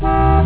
horn

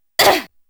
hit; 夏洛特聲音資料庫; 真侍魂

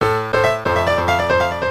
piano

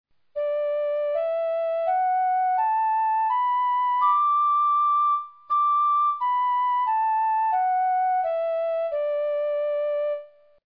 mOHanam, carnatic

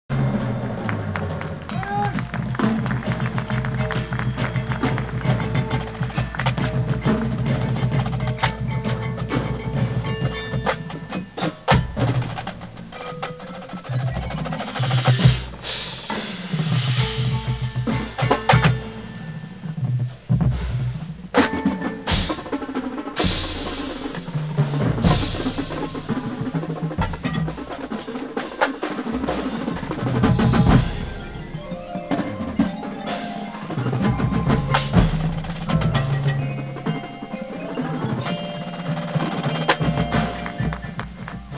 drum solo

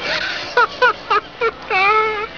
burt laughs

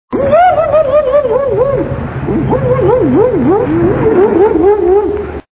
whoop; the famous whoop from the three stooges

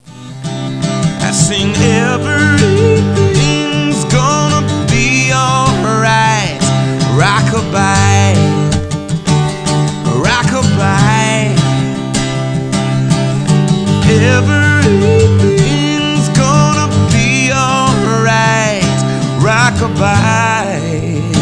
Lullaby Live

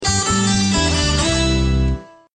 jingle

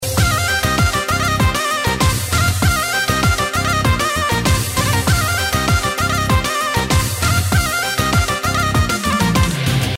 blues

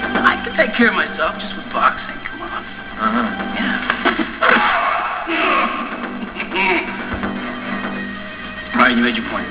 boxing

